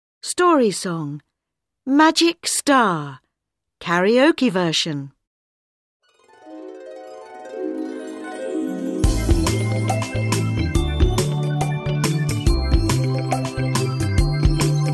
Story song karaoke

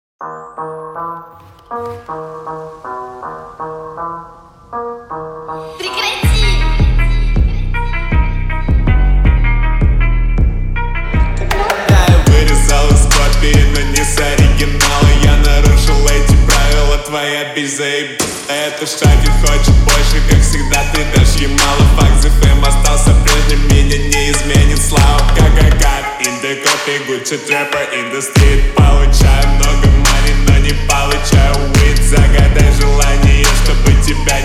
slowed reverb

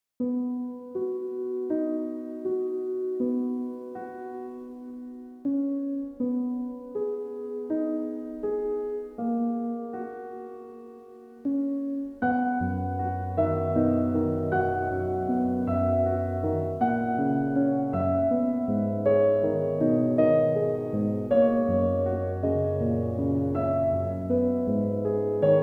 Piano

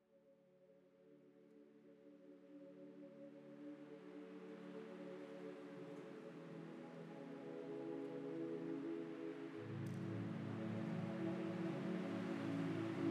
Ocean Sounds; Nature Recordings Sampling XL

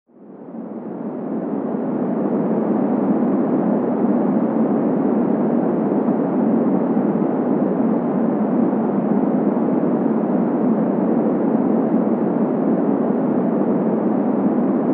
Pink Noise Wind Freq; Granular

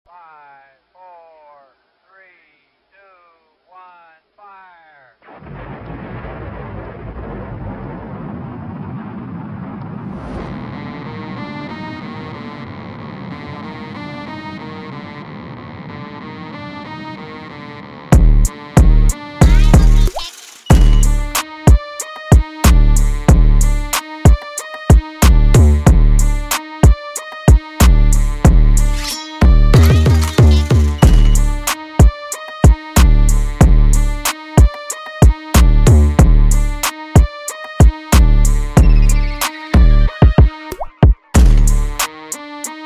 Instrumental

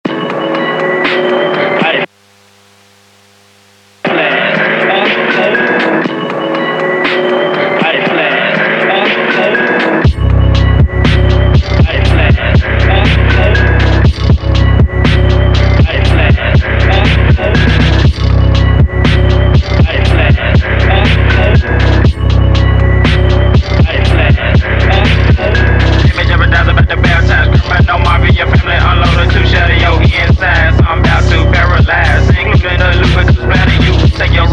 PHONK

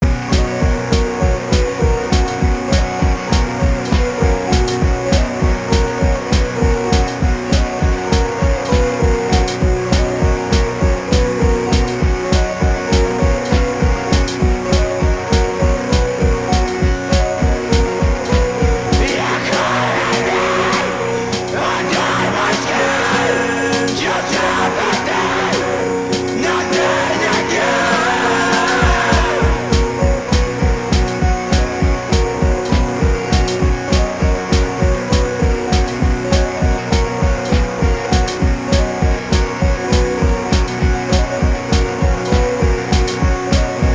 Bitcrush Mix

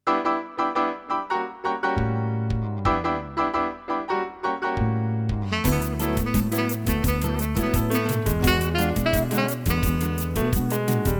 Samba

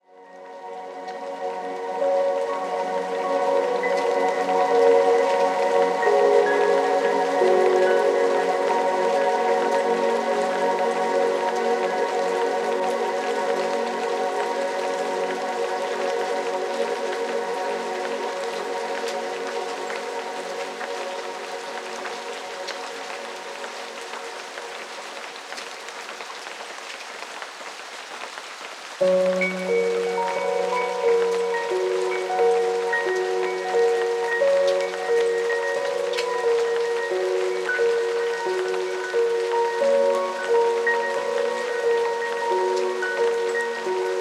Ambient Music

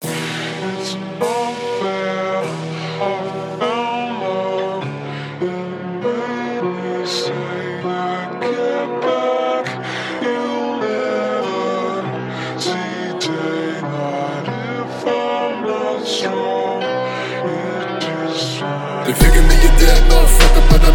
31-33-35hz low bass

